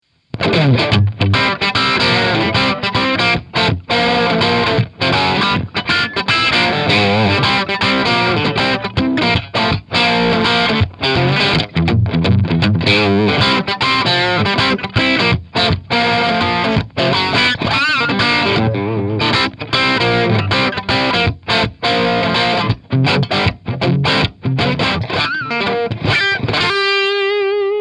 Crunch Bridge PU Single Coil

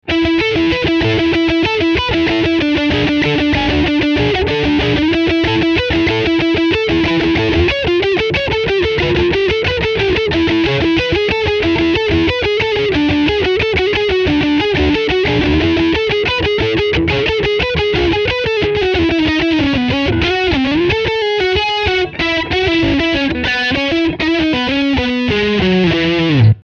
Modern Gain

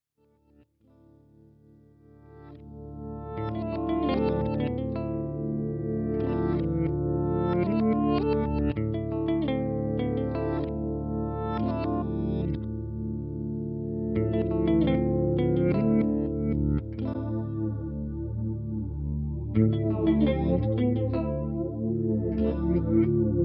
Strymon Zelzah 6 Stage Voice

